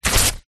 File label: paper sheets x2 rip tear; foley